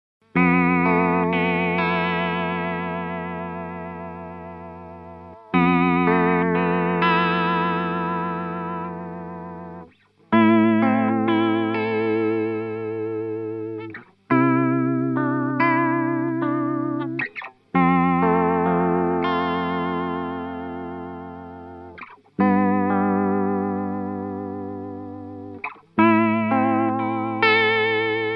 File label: Vibrato Sound